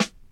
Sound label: sparkly snare single hit g sharp key, snare drum tuned to the G# note